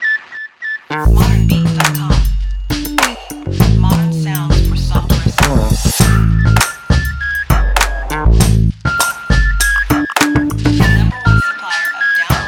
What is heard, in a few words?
Bass Elementz